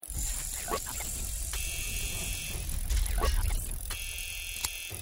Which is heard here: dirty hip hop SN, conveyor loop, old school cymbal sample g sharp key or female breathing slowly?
conveyor loop